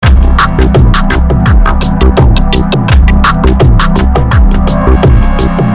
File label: Loop